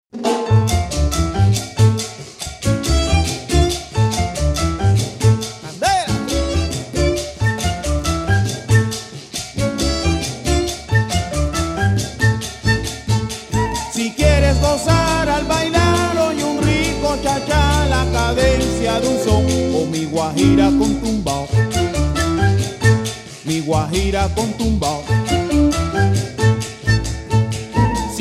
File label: Charanga